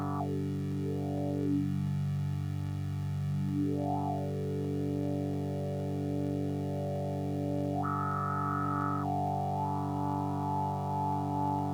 swpet with frequency, weird sound generator samples